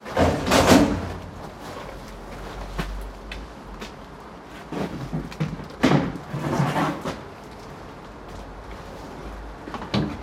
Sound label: Recycling Truck picking bottles and plastic